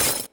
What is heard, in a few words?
combo break